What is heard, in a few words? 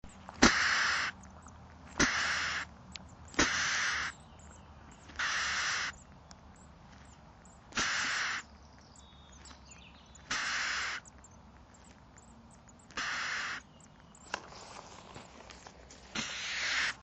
Poecile palustris; SETE